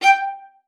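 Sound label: G5, viola spiccato; Xsample VOL-5; 90 s Sample CDs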